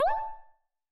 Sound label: gem collect